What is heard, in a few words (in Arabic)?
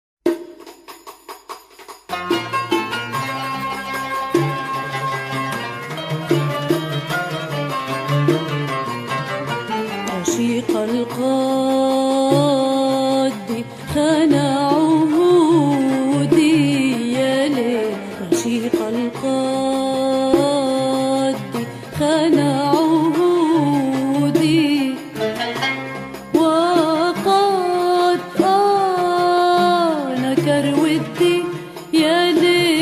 موشح اندلسي Andalusian Arabic